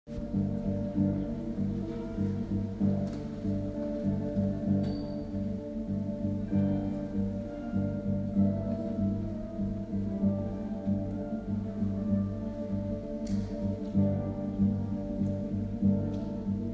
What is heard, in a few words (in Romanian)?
Fragment din Colindul clopotelor, Guitar Quartet; Baltica Guitar Quartet; Ziua Republicii Lituania; Ateneul Roman